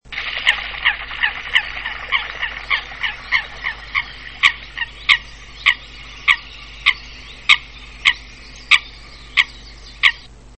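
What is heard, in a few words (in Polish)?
łyska, Fulica atra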